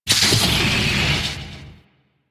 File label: Ship Servo Mech; Ambience; Warframe; Corpus Ship Remaster